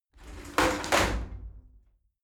shower slide door open heavy

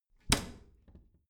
bathroom cabinet door close heavy

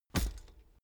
bicycle pedal hit

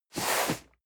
cardboard box medium slide hard; objekte

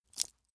metal pouch impact thin

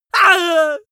goofy male really afraid scream; soundpool

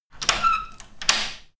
locking wooden door

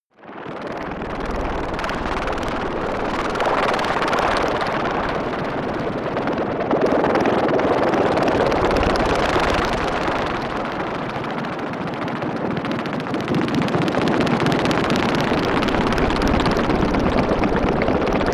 atmosphere helicopter; atmosphaeren

